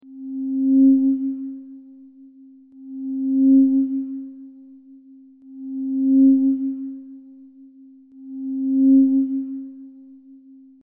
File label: ghostly feedback